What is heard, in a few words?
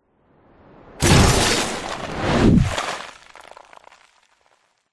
explosion designed massive impact smash glass debris fast shockwave; zapsplat